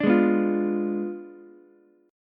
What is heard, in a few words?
F Diminished 7th F down Ukulele; Fdim7 strummed; JGuitar